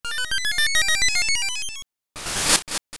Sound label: Heart Container Appear; A heart container appearing